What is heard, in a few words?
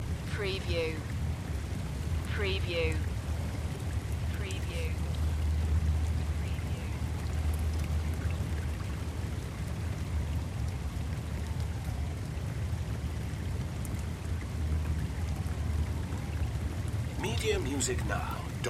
Light Rain And Frogs, swamp